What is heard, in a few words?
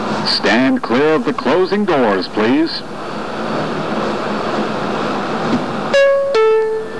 closing doors